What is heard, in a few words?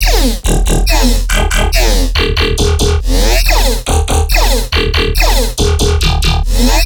Riddim Bass Loop - E 140; Ghosthack; Riddim Essentials Volume 2